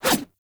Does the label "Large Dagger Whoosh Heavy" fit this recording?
Yes